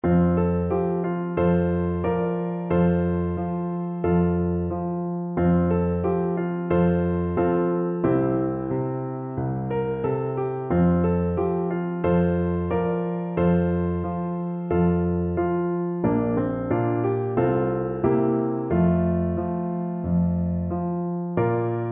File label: cradle, piano